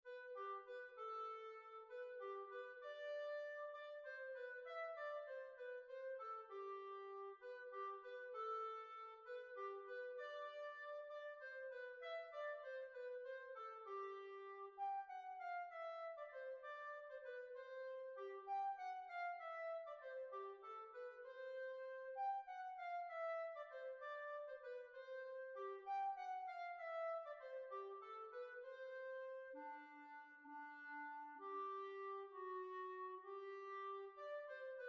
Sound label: Waltz